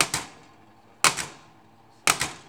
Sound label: TESLA, Science Fiction